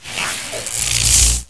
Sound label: cloak on; predator